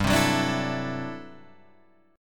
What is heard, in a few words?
D 9th G down Guitar Standard; strummed; JGuitar